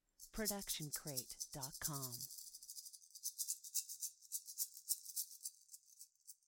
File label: rattle snake; soundscrate